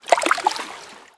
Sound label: well slosh